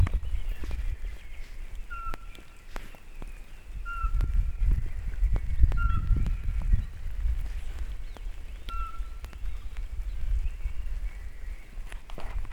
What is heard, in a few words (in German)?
Sperlingskauz, Kerschlacher Forst; Christian Haass